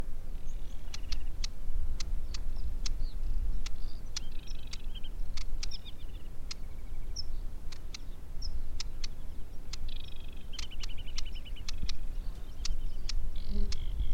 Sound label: Aquatic Warbler Acrocephalus paludicola